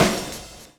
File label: DRUM CHOP; AMG Gota Yashiki Groove Activator